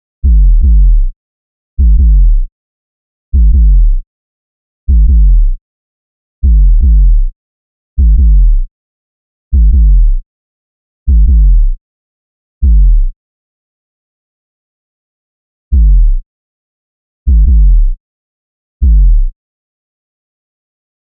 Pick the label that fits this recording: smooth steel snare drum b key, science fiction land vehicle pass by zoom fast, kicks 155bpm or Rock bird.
kicks 155bpm